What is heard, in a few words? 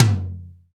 TOM ATTAK, Real Toms, Dry Toms; Roland L-CD701